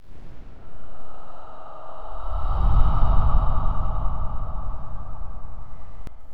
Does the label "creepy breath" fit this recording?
Yes